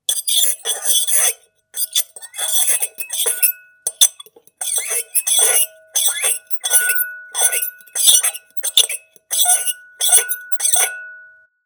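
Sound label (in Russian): звук вилки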